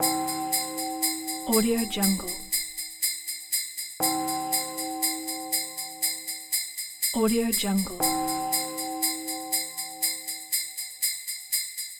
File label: jingle bells sound